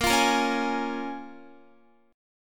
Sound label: Bb Minor 7th Bb, Guitar; Bbm7 strummed